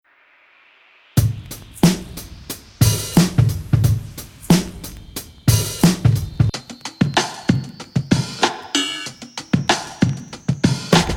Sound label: Golden Breaks; Black Octopus Sound; 1K Golden Breaks; Neighborhood Production